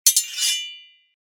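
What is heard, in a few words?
blow and slide; Звук мечей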